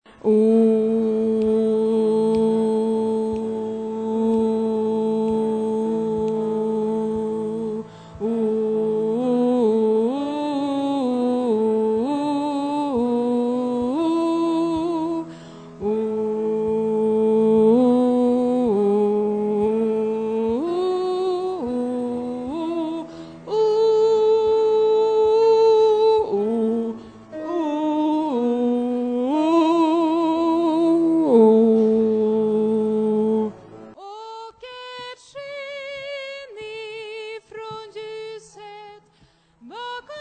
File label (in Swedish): bas